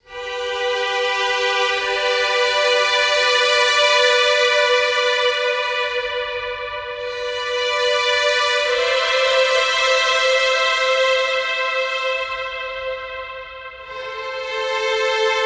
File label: High Sustain Strings - Am 70Bpm; Construction Kits; STEMS; Ghosthack; SkyRealm